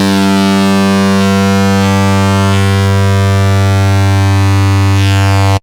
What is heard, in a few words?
Dist Bass G1, Moog Voyager; Moog Voyager Sounds; VES1 Dist Bass